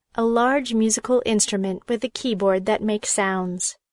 piano

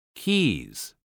keys

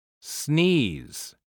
sneeze

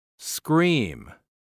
scream

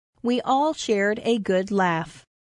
laugh

